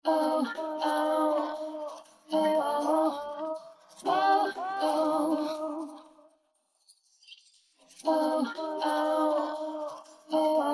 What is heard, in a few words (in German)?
song nur vox; steinberg spectral layers pro 8